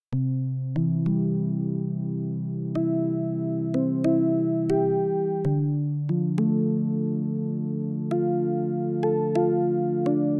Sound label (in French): synth sans effet